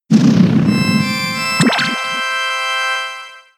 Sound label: Sweet Kiss; attack moves; Pokemon; gen 7; SUMO; USUM; Sweet Kiss part 1